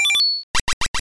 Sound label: Sweet Kiss; sfx; attack moves; pokemon; gen 2; gsc